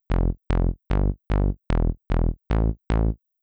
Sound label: Bass Bass